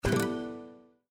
Critical Stop; Garden

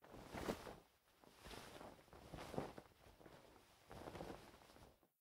Noren Cloth; The Inn

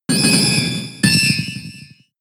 Metal Sound; Pokemon; gen 6; XY; ORAS

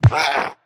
SFX Shield Minion Hit; Android; Portal Quest

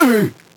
Warrior Pain

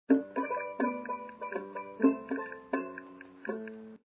phonograph music; gamerip; Papa's Freezeria

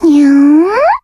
voice cat nya; moe pachinko; android game music

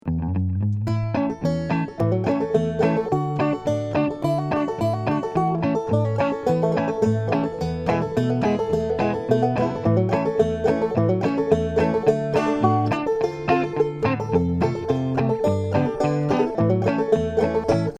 Positive Pos loop banjo gitaar; Outdoors Old

